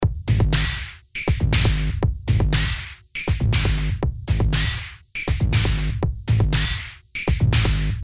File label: Tech Loop